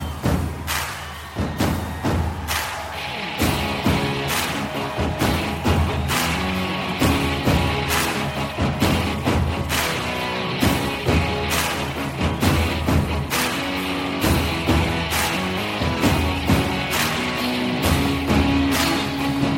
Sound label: Intro BGM Ringtone For Mobile